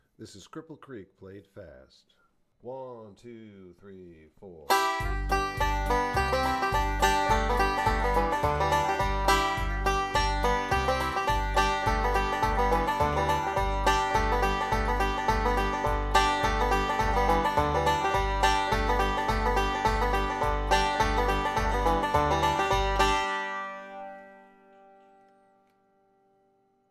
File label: Cripple Creek Played Fast, banjo